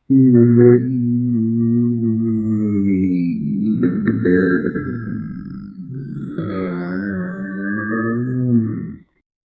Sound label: an imaginary creature, goo